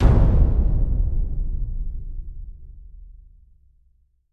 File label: Hit Lo All Together; Brand X Music; Brand X Music Catalogue - Editor's Essentials